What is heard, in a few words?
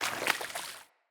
Corrupted Battle Dog Walk Water Step, Foley Footsteps; Animals